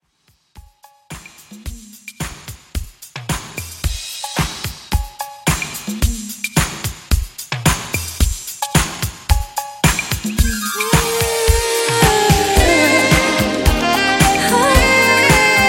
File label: 80s Soul ReDrum, 110 Bpm